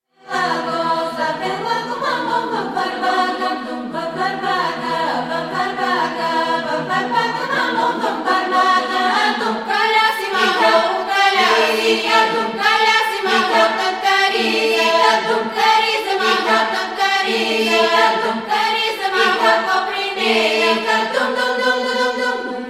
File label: Bulgarian voices, beating the drum; tapan bie